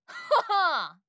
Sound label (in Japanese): っほほぅ！